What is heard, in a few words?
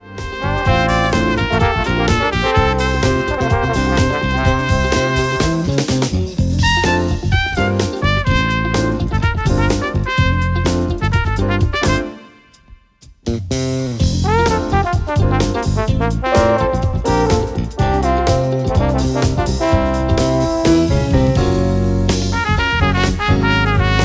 Walking